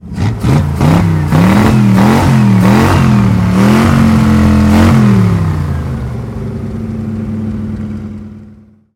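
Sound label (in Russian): звук разогрева двигателя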